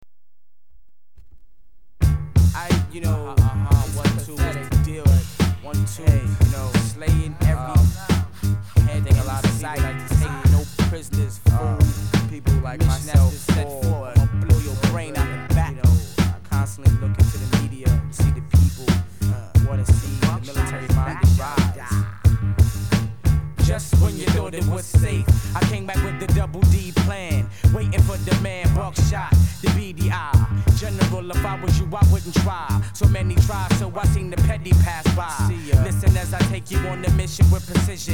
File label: hiphop